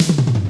PERC COMBI; Crypton - DrumBase5000

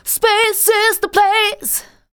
vocals sung; Techno Trance Essentials

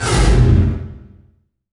IMP FLAME, Cinematic Impact

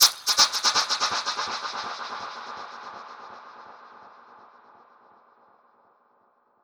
Perc Hit, dub percussion; musicradar

